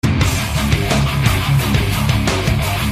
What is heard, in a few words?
blur game ringtone